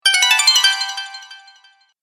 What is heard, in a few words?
Bright Stage Complete; Video Game Sound Effects